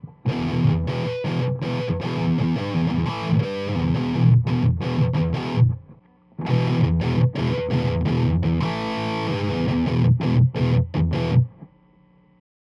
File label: recto org mdrn